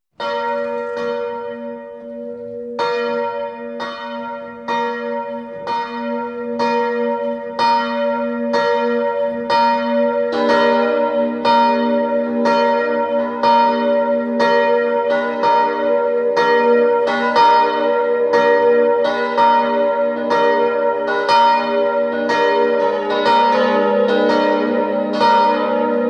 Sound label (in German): glocken